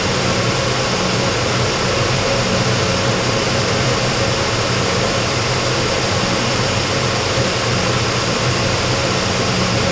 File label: Aircrafts Engines Engine TV3117 In L; DCS